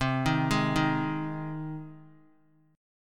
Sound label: C Minor Sharp 5th C down Guitar; arpeggiated; JGuitar